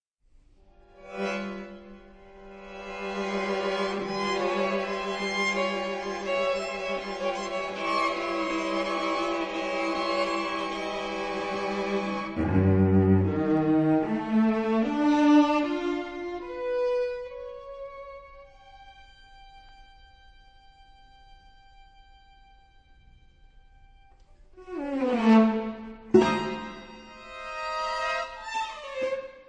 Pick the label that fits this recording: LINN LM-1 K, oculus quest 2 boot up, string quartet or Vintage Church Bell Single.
string quartet